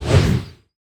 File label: Spear SWING heavy; Cielonos